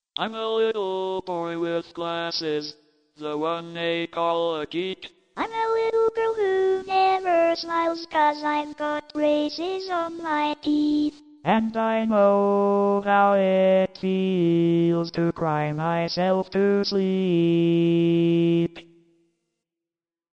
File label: VOCALS ONLY